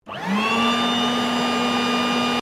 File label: Vacuum Cleaner Outdoor Switch On; Ryobi; Household Appliances